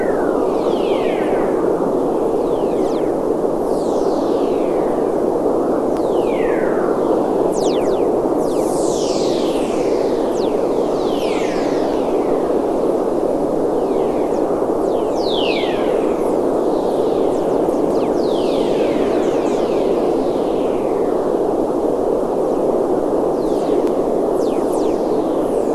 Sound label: WFR audio emfisis